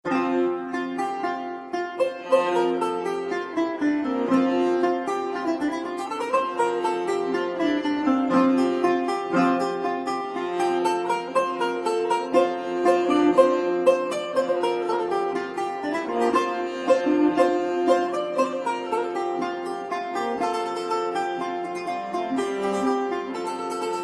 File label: lute vielle